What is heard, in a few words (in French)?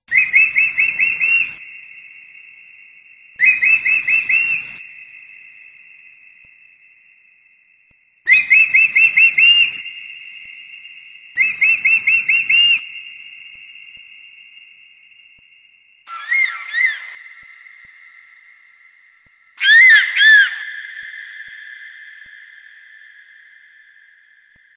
aigle